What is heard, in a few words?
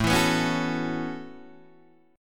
A 6th Add 9th A down Guitar Open D